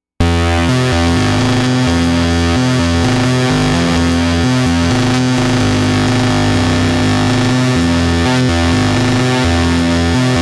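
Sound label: MOOG MAVIS Fuzzy with Stereo Echo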